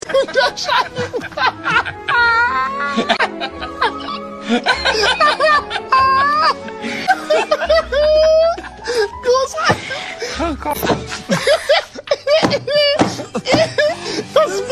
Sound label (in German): lachen